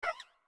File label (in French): Cri; cris EV